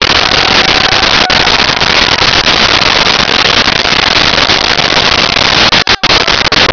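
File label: ambience crowd cheer sky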